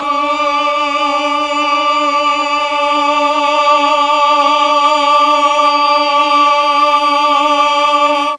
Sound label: D3 8VOICE CHOIR, mellotron